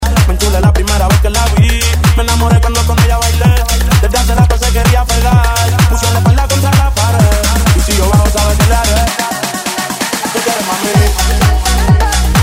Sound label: deep house and brasilian bass